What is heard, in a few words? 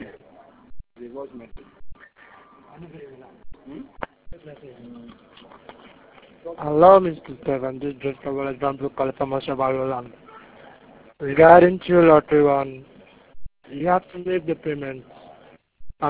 lead